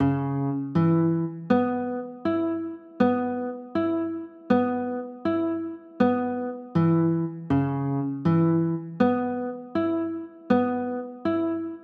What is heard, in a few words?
c sharp m7